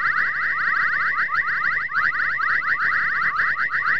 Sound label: weird tweets, fx